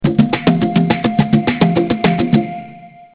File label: Inspired Nimble Beat; Blackberry Bold